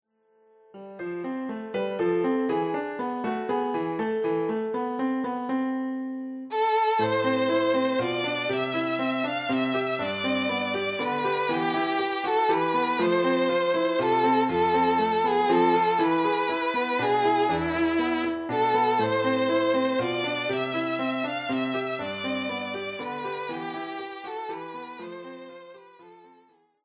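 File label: Greensleeves violin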